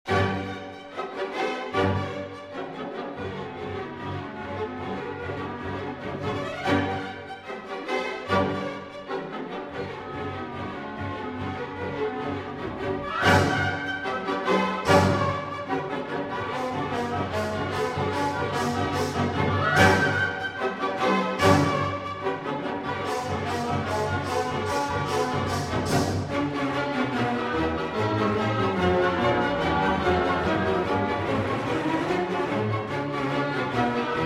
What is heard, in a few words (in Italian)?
Romanza Per Violino E Orchestra F-Dur